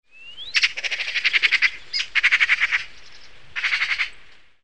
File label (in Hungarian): szarka, madárhang